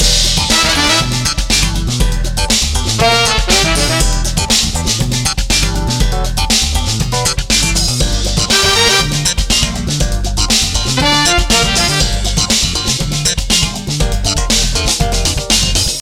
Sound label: Rolling Funk Ozone OFF